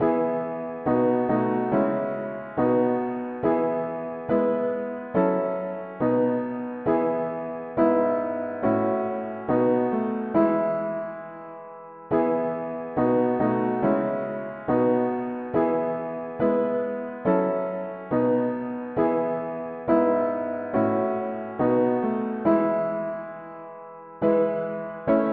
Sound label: mono piano, hymns; Ecoute eglise bien aimee